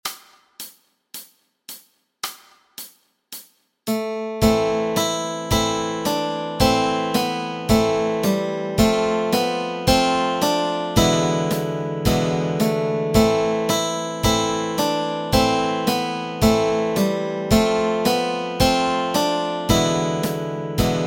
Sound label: God Rest Ye Merry Gentlemen melody and chords